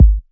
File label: Kick, Dubstep 808 Kicks; GhostHack